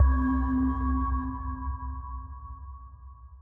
Atmosphere, C; Zenhiser

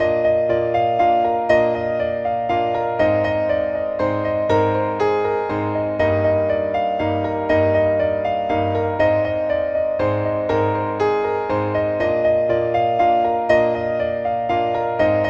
Key Bb 120 BPM

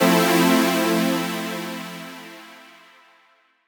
synth superchord Fmaj7

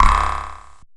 percussion

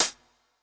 909 CLOSED HIHAT MED; MACKIE

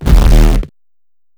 DNB BASS SHOT D-

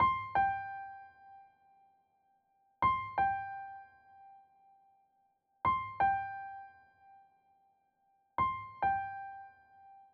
Cmn 170bpm piano; Trench Baby Vol. 5

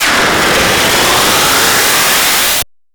fx system destruction sweep; MORE GIRAFFES

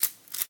Click Clackz

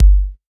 Single Kick

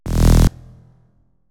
SYNTH HIT E

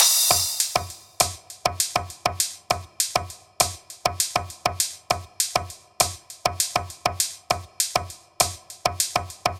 100 Top Loop

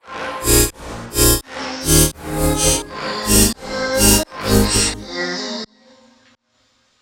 170bpm

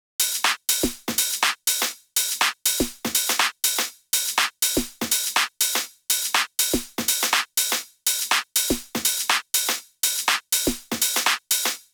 Drums Top, 122

